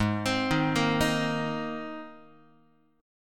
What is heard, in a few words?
G sharp Major Add 9th, Guitar; G#add9 arpeggiated; JGuitar